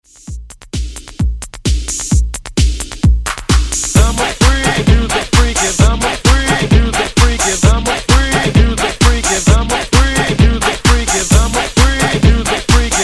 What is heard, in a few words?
Hype Re Drum 130Bpm